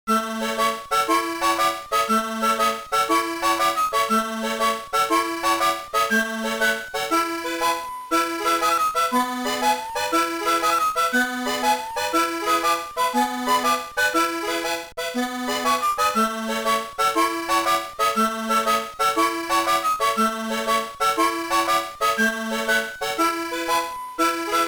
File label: Intro Music; SpongeBob SquarePants Revenge of the Flying Dutchman; GBA